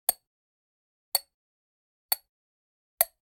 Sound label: Generic Click Glass Tiny; boom library; The Interface